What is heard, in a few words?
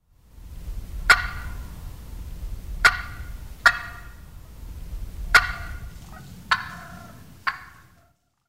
cluck turkey call sound